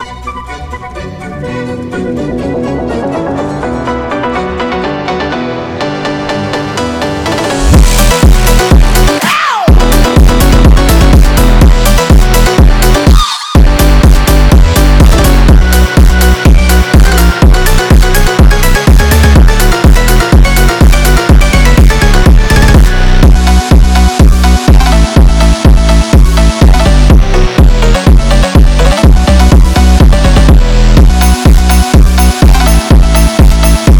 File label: BACH PHONK